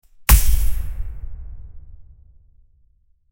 punch sound, fight

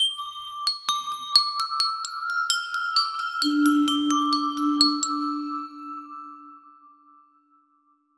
christmas ambient backgro

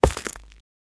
stepping on bedrock

